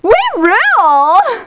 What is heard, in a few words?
LAUGH; BattleSNDS